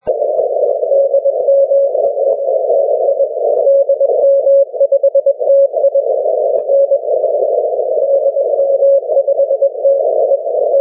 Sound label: cw; 80m